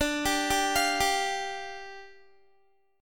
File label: D Minor Double Flat 5th, down, Guitar; Dmbb5; arpeggiated